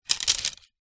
tent pole movement; foley

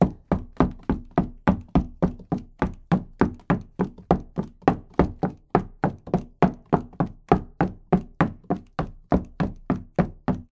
Footsteps Female - Stilleto Heels Wood Floor Run

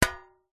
foley tin metal cylinder lid on flick ping impact; zapsplat

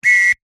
foley whistle two tone single blow close up

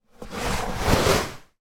foot slide into wellington boot; foley

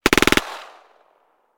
gun ppsh; still north media

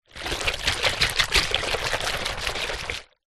hot water bottle rubber full water shake hard; household

